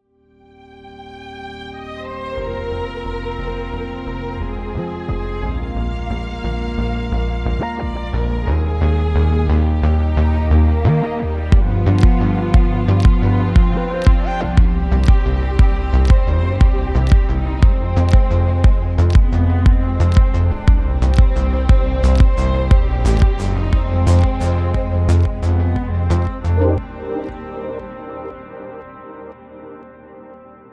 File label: Adagio In G Minor Albinoni Remix